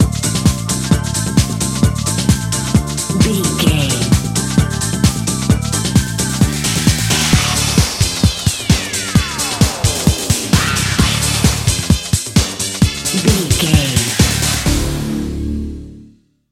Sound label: Fashion Show Bumper, Dance, EDM, Club, Exercise, Aerobic, Aerobics, Spinning, Workout, Disco, Eurodance, Confident, Energetic